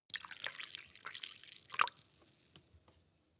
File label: Foley Pour Coffee In To Mug